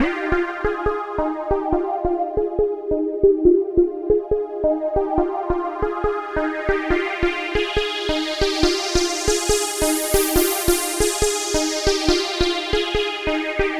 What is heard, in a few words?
Trance Synth